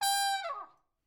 bumper trumpet blast

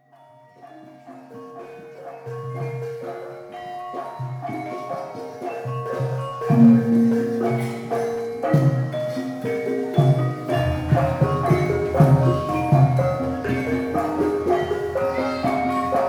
Gamelan Kraton, INDONESIA

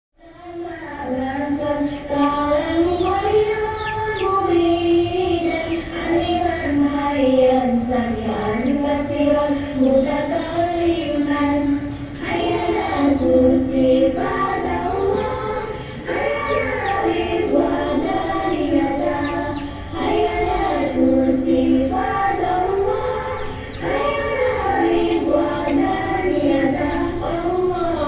Mosque Female song, INDONESIA

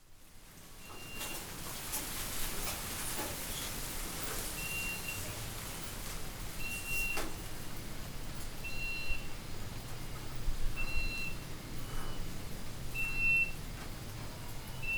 Beeping birds, INDONESIA

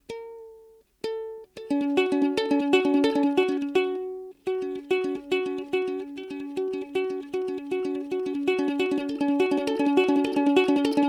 guitar and dental floss strings; 10 bucks guitar and dental floss strings

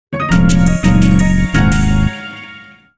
Relaxed Out Text Notification

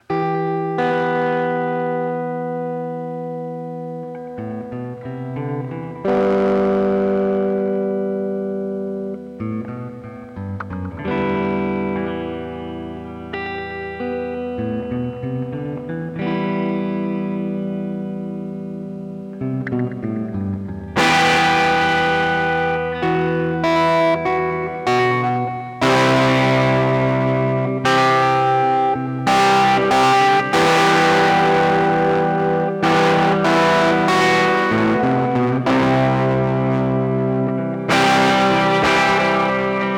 Overdriven Melancholic Guitar

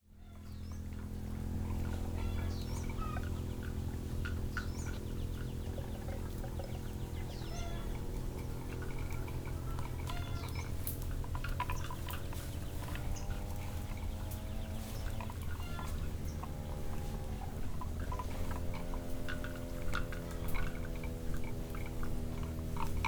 Wooden Cow Bells, INDONESIA

